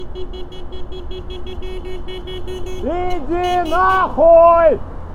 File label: ringtone